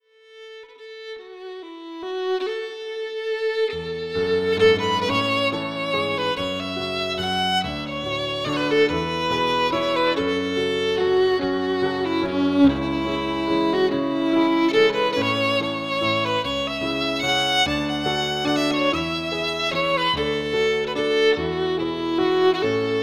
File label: 12 Waltzes